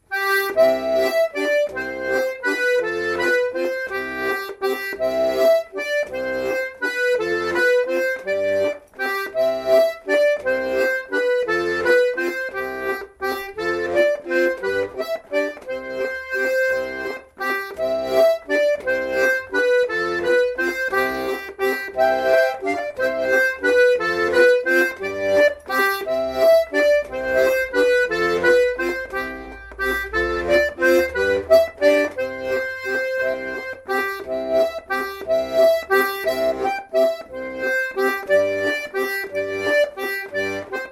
valse ecossaise

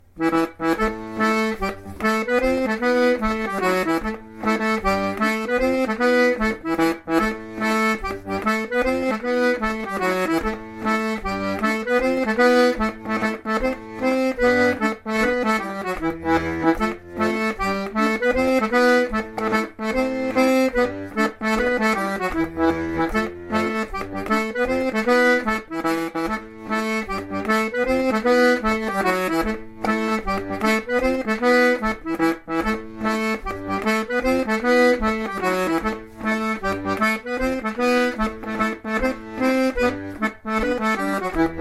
rond

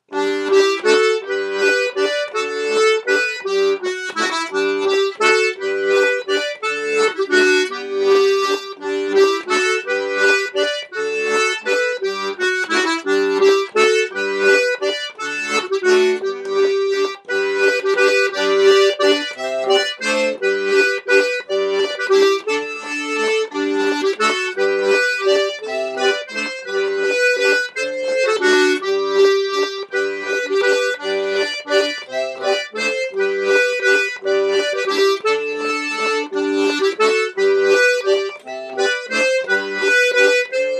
valse

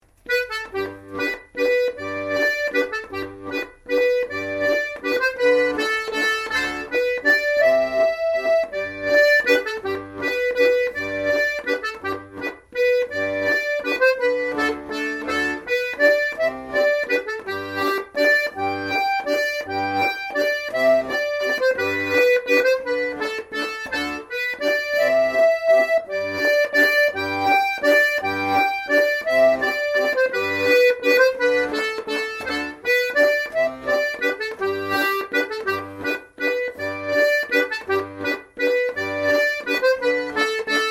valse anglaise

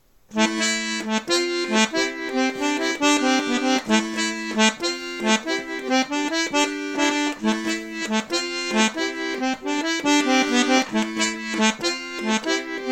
bourree de montfort, accordéon

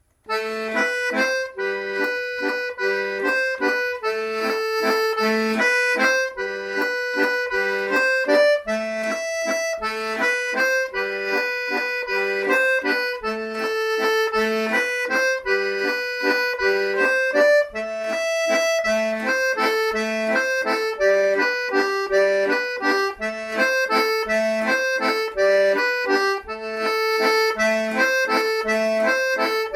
valse

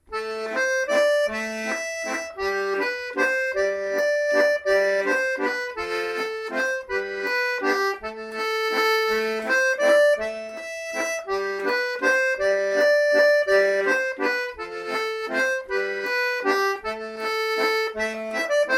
valse

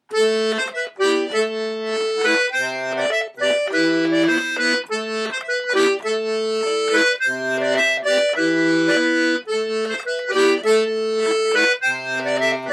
bourree en motet

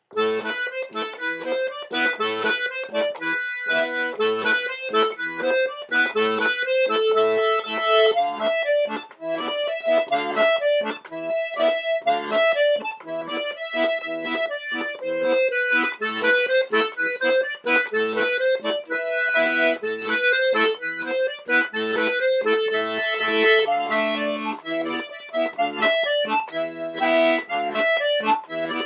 polka

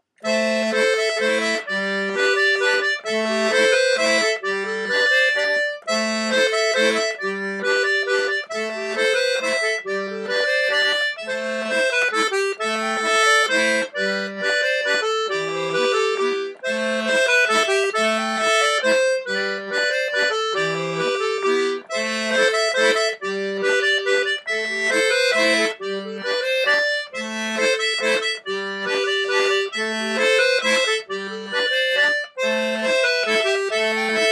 valse

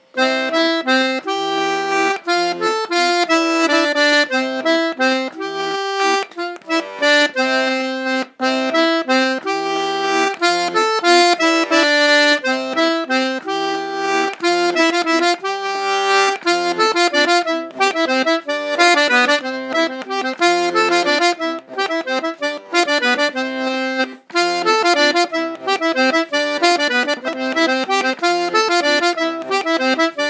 valse

